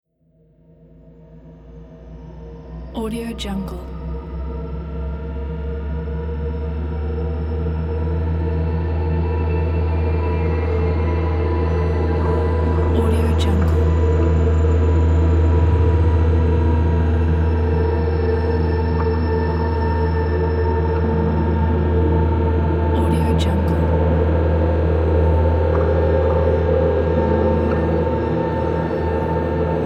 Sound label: mystical eerie ambience